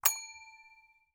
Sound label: Elevator Ding